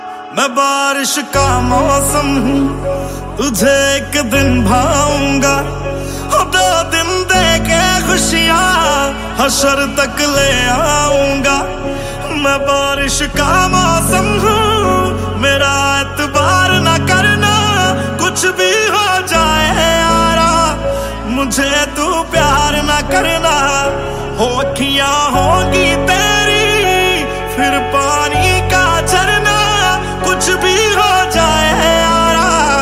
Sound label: Sad Hindi Song Ringtone